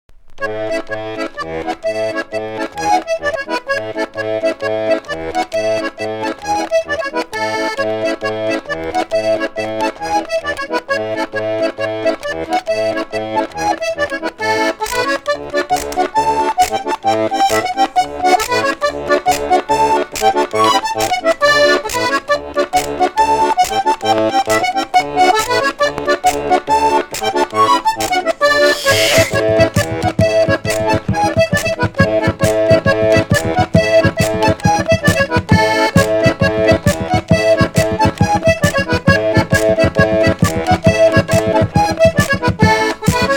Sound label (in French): Folk Traditionnel Franais, Marche de fin de bal